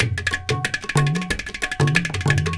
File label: INDIA L; Spectrasonics - Heart of Asia CD2